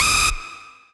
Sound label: lead